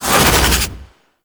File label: Fairy Flight Cast Air